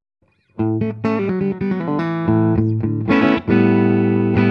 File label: clean bridge